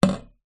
impacts metal pole hit single pane glass window gentle x 1